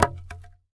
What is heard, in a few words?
Plank Drop